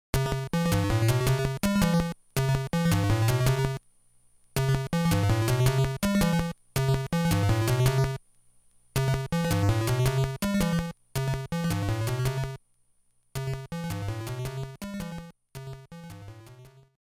coin room; Super Mario Land

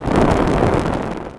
ROCK

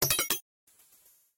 Jingle; Sega Channel Demo Cartridge #4; Genesis

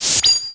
ROCK

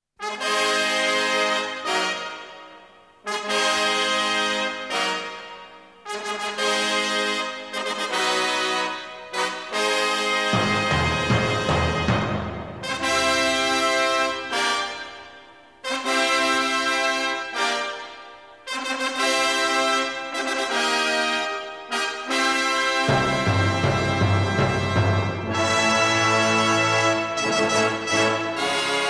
Fanfare; Ren & Stimpy - Production Music vol. 3

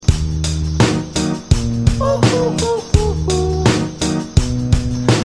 Level End

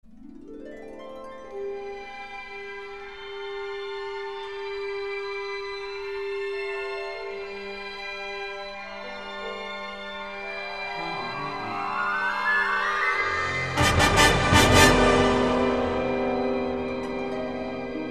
Dramatic String Effect; Ren & Stimpy

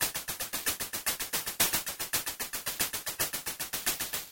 Game Over; Genesis

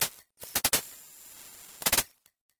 Boom Boom; The Tick; Genesis

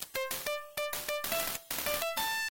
Game Set (Game Over); Zero Wing; Genesis

